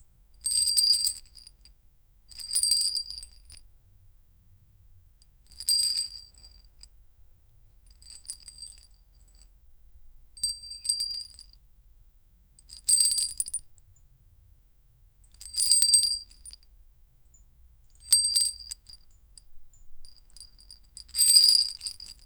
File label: the thick anchor chain is, chain